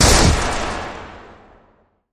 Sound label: Hard Crashy Blast Impact